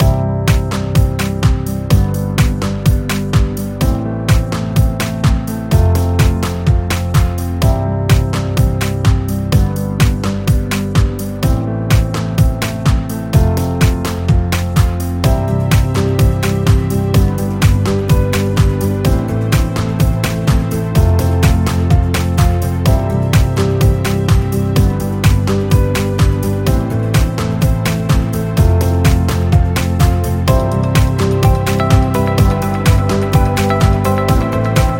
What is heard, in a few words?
Corporate Loop Motivation